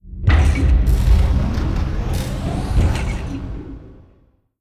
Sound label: dyson sphere build start; Stellaris; utopia